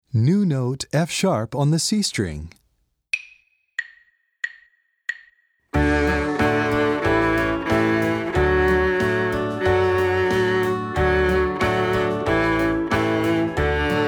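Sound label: New Note F Sharp C String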